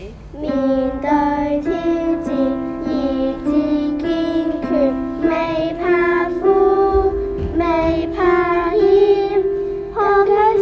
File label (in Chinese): 舊曲新詞